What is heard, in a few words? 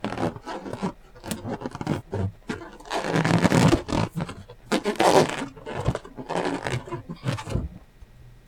Balloon rubbing